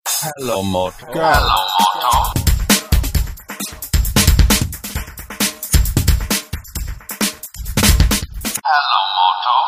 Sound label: Moto Sms, SMS Tone